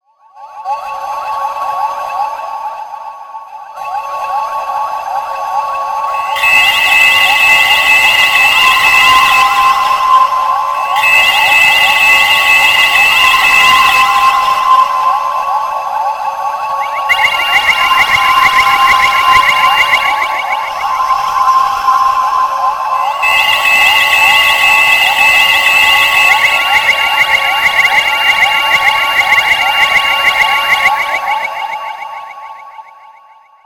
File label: alarm fizz